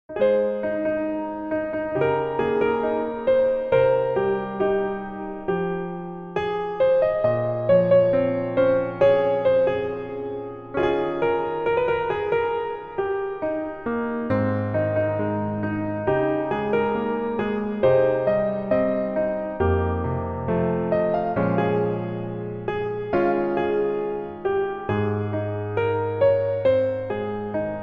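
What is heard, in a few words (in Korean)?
Piano version 멜로디MR -1키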